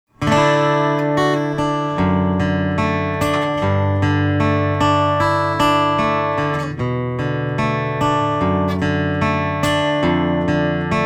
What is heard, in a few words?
ARP PICK CPX, guitars; musical-instruments; el-ac-guitars